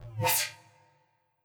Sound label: Sceptre; Legend of Keepers (gamerip) (2019); Godess Sceptre M Wiz